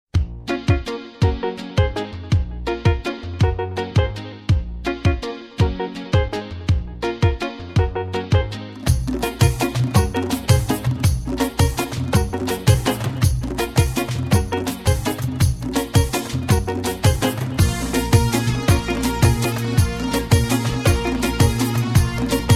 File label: B♭ minor bpm 110